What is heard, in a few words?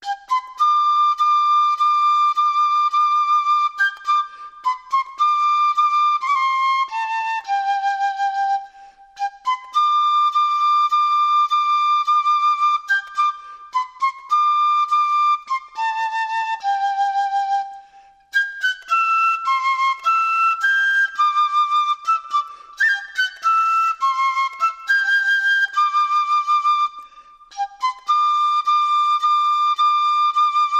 Solo Fife; Halls Of Montezuma